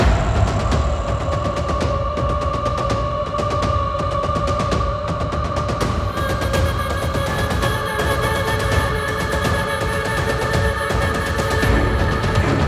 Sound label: Login Music; Login Screen; Zariman